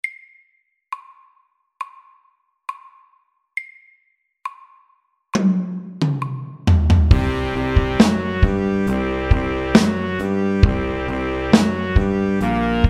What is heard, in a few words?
Have some funk SLOW 68